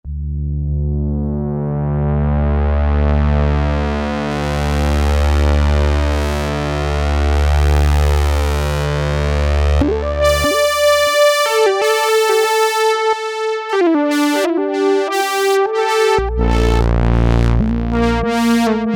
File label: Sweep Drone